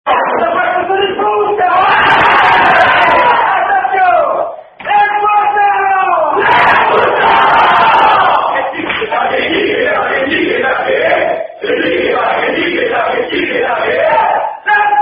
Cummulative Chant; Les Pouces En Avant !!

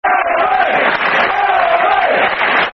Fulham Army, Football Chant (Soccer Songs)

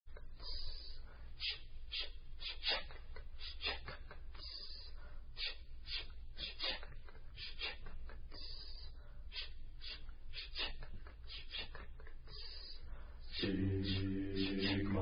Dorian Chant; Sigma Phi Epsilon

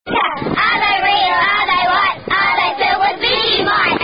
Ohh Ahh Bra; janice ackerley collection

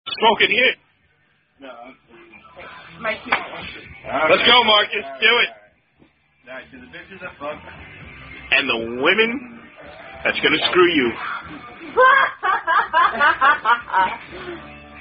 Drinking Game; Kings Cup

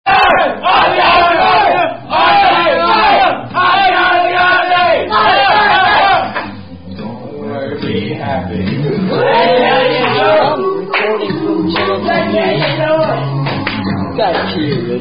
Aussie Aussie Aussie, Aussie Aussie Oy Oy Oy

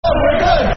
Football Chants (Soccer Songs); Come On Wigan; Wigan Wafc; fanchants

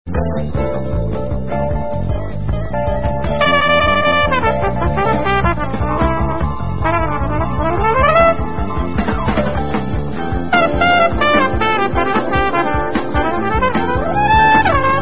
Walking Theme (Instrumental)

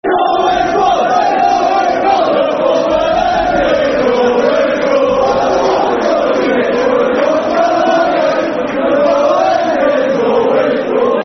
Fulwell End, Football Chant (Soccer Song); Newcastle United Nufc

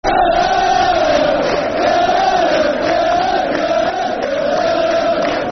Der Der Der, Football Chants; Sheffield Wednesday Swfc

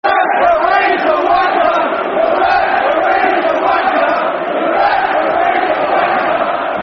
Football Chants (Soccer Songs); Referee's A Wanker; Reading Rfc

